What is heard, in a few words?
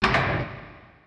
Folder Closed, Horror; ESAN VO Sound Schemes; ESAN Horror pack